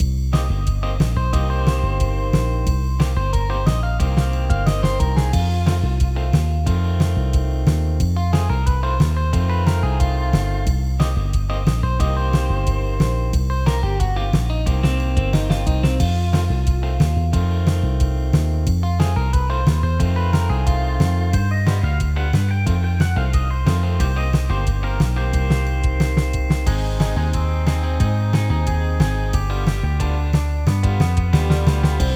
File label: Surf Rock Light Loop; Tax Fugitive